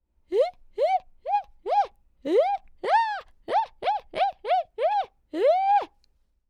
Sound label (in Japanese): uragoe